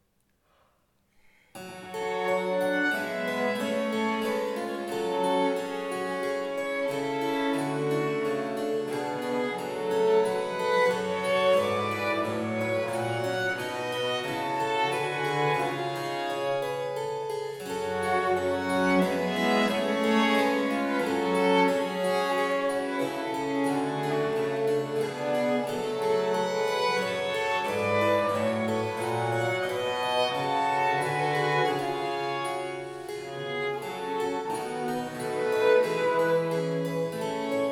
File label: Purcell Dance, Ensemble für Alte Musik